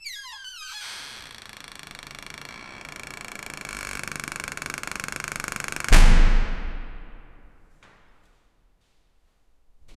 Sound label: opening a large heavy anc, ancient